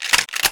m3 pump, 1897trench; weapons

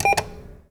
press, atm

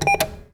press, atm

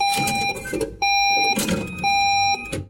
atm spit out done

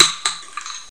shell, 1897 trench; weapons

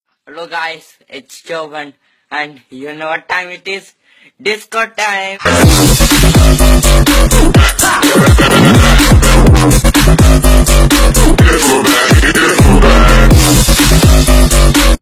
disco time; surftimer

